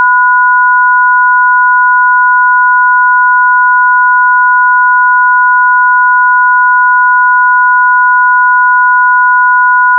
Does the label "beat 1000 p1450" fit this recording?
Yes